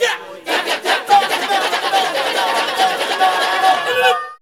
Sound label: CHATTER; ETHNICSTUFF